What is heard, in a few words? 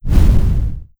explosion; NIIIEMAND's explosion sfx